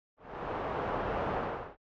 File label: Swipes